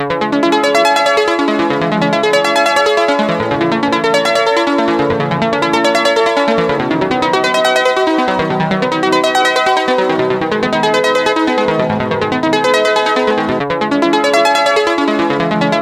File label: Synth Harp